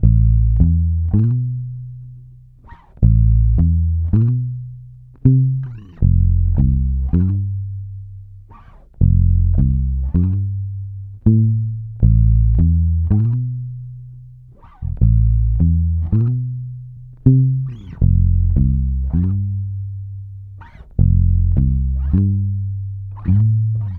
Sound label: Bass loop 80 Am; Electric Bass Loops; Slate Digital BASSHOLE Sample Pack